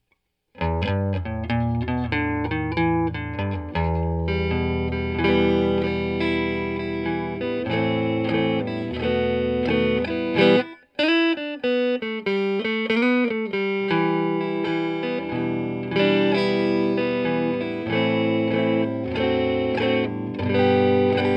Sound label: Deluxe Reverb with Internal Reverend Alltone 1250